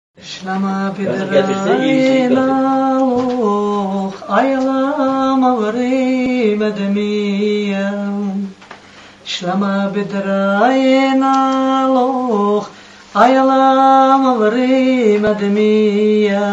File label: aisors song, assyrians